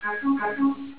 notification

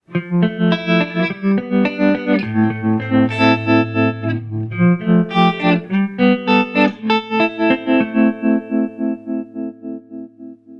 Soft Rock, ringtones; Marshall; London KB 1501

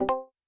disconnect; Xiaomi MIUI 9

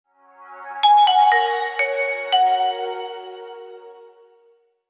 wake up tune, Nokia N900

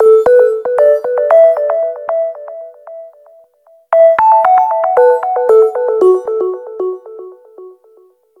Neon, ringtones; U2

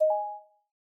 wide selfie boundary line; Samsung; Galaxy S8; SamsungCamera7

